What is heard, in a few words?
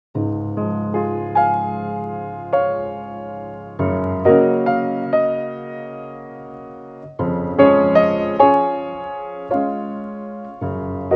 piano